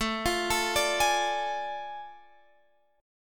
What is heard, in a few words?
A Major 7th Suspended 4th Sharp 5th